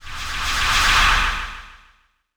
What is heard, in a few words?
sonic